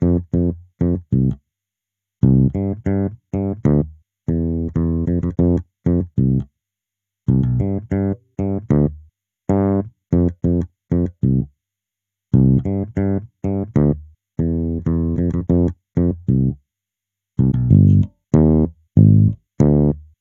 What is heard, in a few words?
Elec bass; super crush k.o.